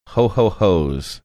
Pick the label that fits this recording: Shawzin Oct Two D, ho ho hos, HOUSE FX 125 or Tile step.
ho ho hos